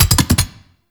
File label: Wall Climb Cast Step Twirl; Warframe; Oraxia